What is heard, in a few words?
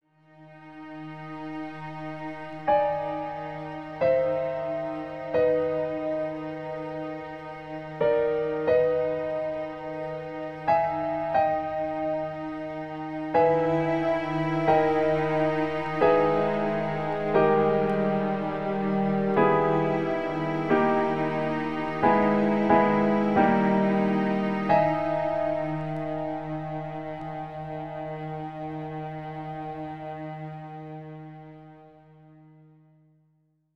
Pause to think, piano, strings